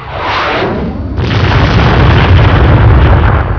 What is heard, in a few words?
missile